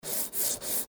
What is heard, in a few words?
metal sawing